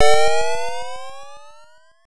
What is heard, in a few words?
River ~ Ocean Level Complete